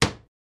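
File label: Hammer Nail Wood Single; hammers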